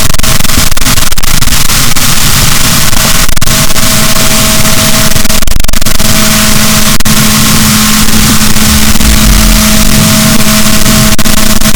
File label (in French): rorquals, boat, Balaenoptera Physalus; SNR 6dB